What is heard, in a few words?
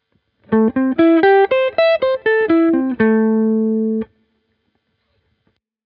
blues, guitar